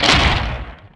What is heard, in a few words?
bone wall cast; d2lod